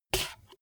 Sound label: bmx bike tire foot scuff long